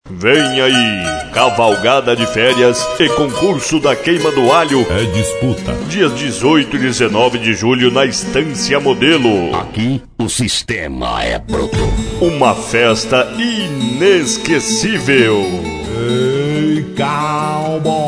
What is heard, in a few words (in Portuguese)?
vinheta da cavalgada; queima do alho